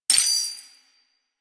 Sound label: Step Spur, Foley, Footsteps; Enemies; Warframe; Duviri; Dax